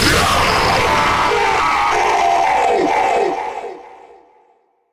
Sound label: defection scream; sonic exe